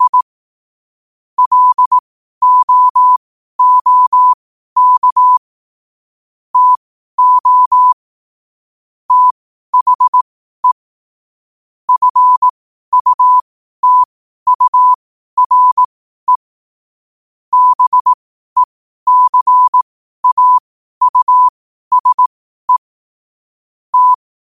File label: 5 wpm, morse